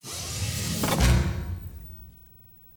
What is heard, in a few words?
modal open